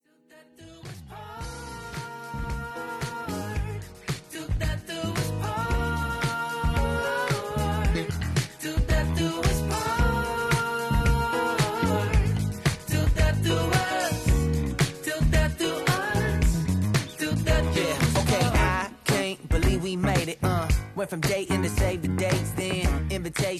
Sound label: no guitars